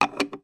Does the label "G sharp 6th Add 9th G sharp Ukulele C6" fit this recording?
No